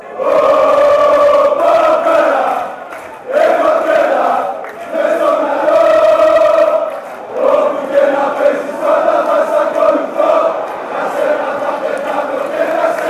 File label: woah chant, fanchants